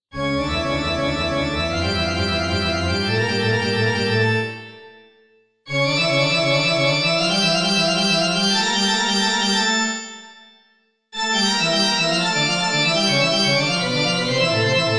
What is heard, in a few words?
organ concert; reverb demo